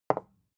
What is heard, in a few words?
bottle put down